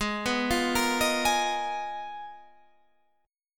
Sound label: Ab Minor 6th Add 9th, Ab, Guitar, Standard; arpeggiated; JGuitar